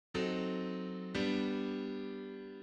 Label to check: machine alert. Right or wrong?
Wrong